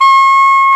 SAX A. MP C, Alto Short; Roland LCDP07 Super Sax

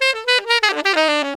SAX LICK, BRASS LICKS; Ueberschall; Jam Box

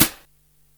Boss DR-660 Snare, Drum Machines

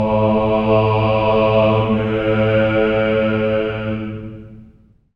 amen; Spectrasonics; Symphony of Voices; GR AMENS

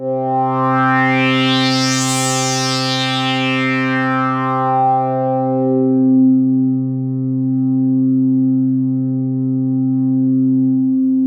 H.SWEEP, BIG SWEEP; Masterbits; Vintage Voltage

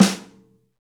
Rock Snare, Roland BK-5; Snares

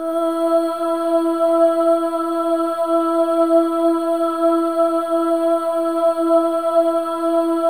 SOLO BOY, AH; Spectrasonics; Symphony of Voices

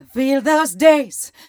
f mel e flat, fem Vocals; Mutekki Media; Diamond Vocals 2

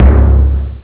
Kettle, PERCUSS.ION, Drum Hits

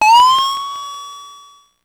Drum, Electro-Harmonix Spacedrum

